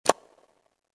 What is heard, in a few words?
click; shadow